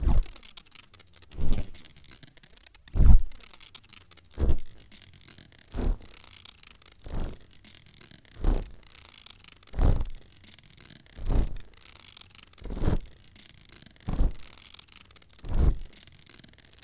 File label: windmill